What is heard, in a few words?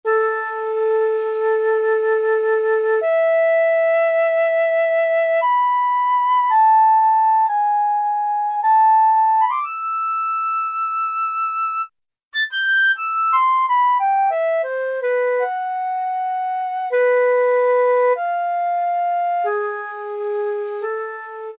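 Flute Bright; Brass And Woodwinds; Roland L-CDX-03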